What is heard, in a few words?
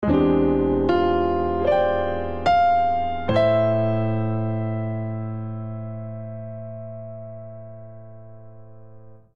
Cadence Classic Jazz V7alt - i A Minor 77 bpm, Grand Piano; MIDI Clips; Ableton